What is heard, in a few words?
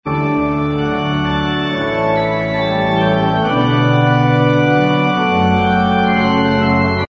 pachelbel canon closing organ